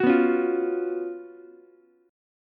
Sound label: F sharp Major 7th Suspended 4th, Ukulele; F#M7sus4 strummed